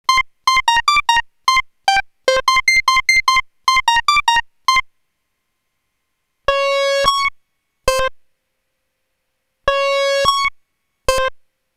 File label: HS303 pulse high